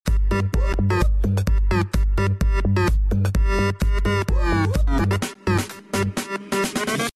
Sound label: house beat